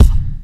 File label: hip hop steel kick drum sample b key, Royality free kick drum sound tuned to the B note